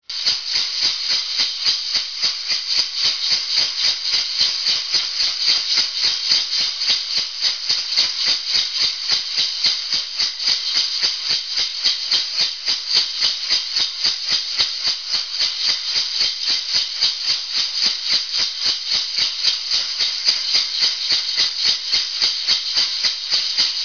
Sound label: rattles